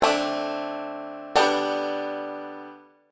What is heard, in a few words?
d7 to g progression, banjo; beginning 5 string